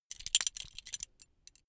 metal belt buckle clatter long heavy

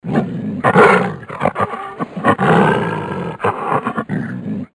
lion growl

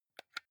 console controller xbox onoff press soft

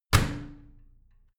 washing machine slam shut

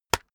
keyboard external enter hit push

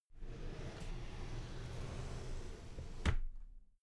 drawer cabinet paper open soft

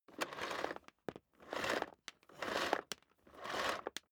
vacuum cable drag out sequence

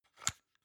plastic hook fold in

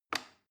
plastic button small release regular

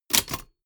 typewriter

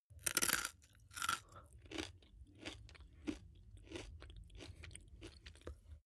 eat potato chip mouth closed normal

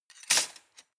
cutlery large multiple drawer place hard

